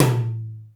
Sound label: AMBNT HI, TOM; East West - Global Sounds; D4 BD SD TOM